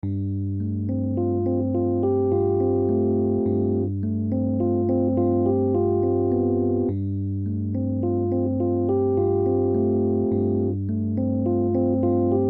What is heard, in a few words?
Chorus; Mikron Chorus